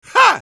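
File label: Heavy laugh short, Spanish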